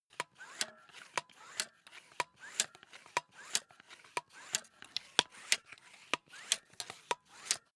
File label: air pump small sequence